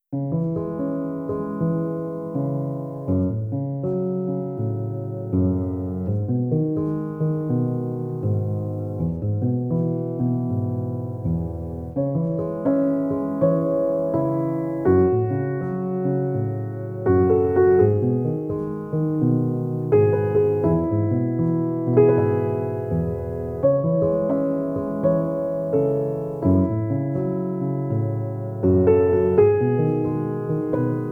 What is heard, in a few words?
Piano Preludes